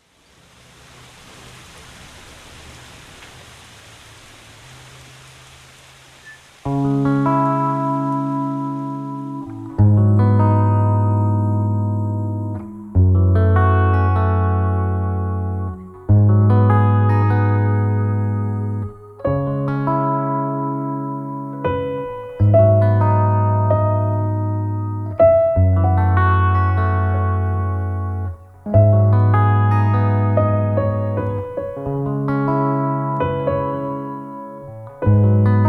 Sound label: Instrumental